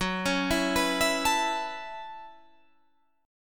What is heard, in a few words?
F sharp Minor 11th, Guitar, Half Step Down